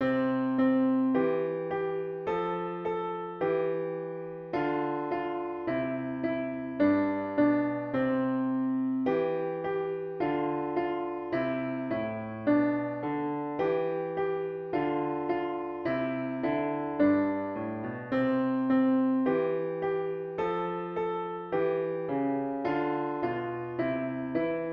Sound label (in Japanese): ピアノ